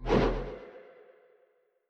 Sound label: item grudge